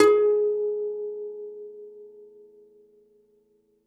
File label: DOMRA, Segundo Domra, Plucked Strings